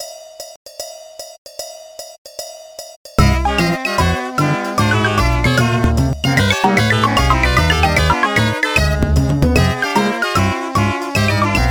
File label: COOL SAILOR JAZZ